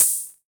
open hi hat sound d key

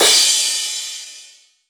big drum crash d sharp key; Cymbal drum one shot; drum sample; single hit

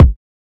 tight kick drum single hit c sharp key

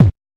old school clean bass drum sound g sharp key

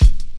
strong bottom end kick single hit c sharp key

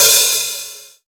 light open hat sample g sharp key; open hat samples

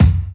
tight kickdrum f key, Loudest frequency: 199Hz; kick drum samples

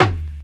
hi tom sound d sharp key; tom drum

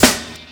steel snare drum sound a sharp key

